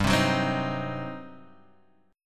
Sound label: G Major 7th Suspended 4th Sharp 5th, Guitar, Open F; strummed